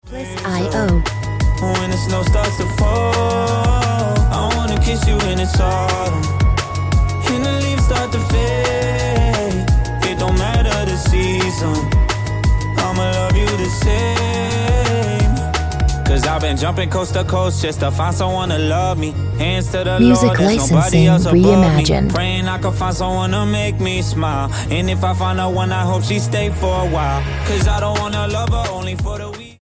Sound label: Ringtone